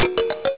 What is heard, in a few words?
popcorn